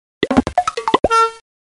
gudok multash

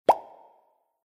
zvuk puzyr

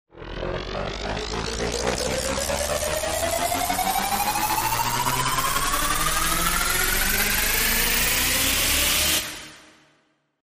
postepennoe uvelichenie skorosti v reverse

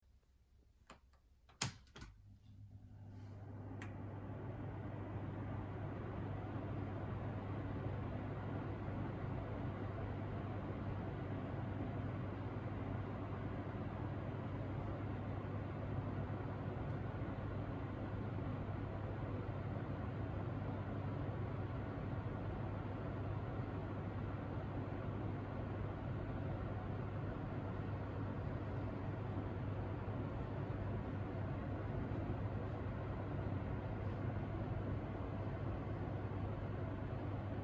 zvuk vytiajki kuhonnoi

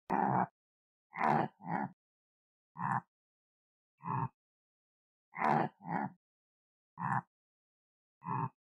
gazelle, zvuk gazeli

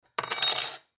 lojk bros na stol

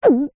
glotaet

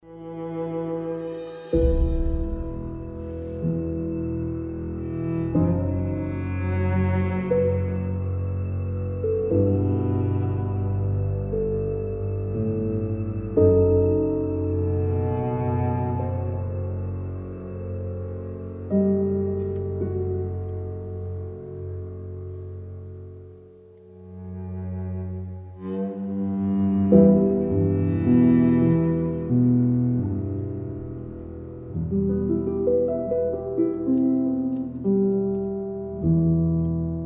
zvuk grusti

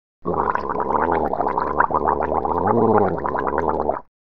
Poloskanie gorla

